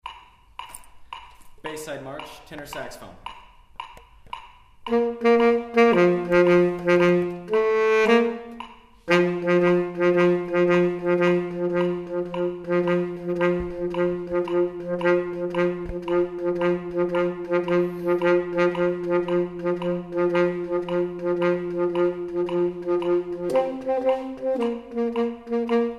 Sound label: Bayside Tenor